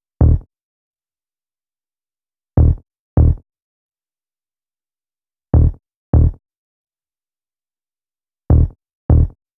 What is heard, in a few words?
Synth Bass; Basses